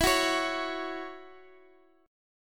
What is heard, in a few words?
C sharp Minor Double Flat 5th E, chord